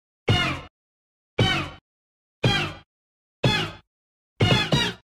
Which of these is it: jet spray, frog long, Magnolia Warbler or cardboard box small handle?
jet spray